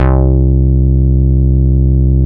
Mini bass C2, Mini Bassics Vintage Keyboards; Big Fish Audio - First Call Vintage Keyboards

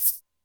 Shaker, Alesis D4 drum machine

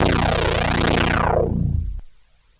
Monotron Sci-Fi

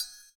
CYM, Ethno Techno; ILIO - Ethno Techno

